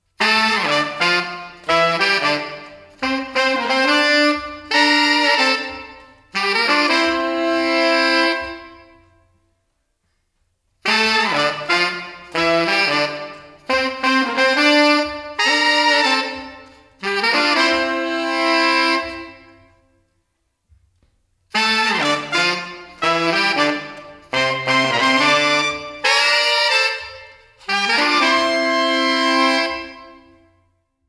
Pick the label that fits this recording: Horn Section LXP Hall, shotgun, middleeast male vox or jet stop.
Horn Section LXP Hall